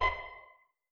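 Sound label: pizzicato F#4 PP, STRING PIZZ; Sound On Sound - Peter Siedleczek's Orchestra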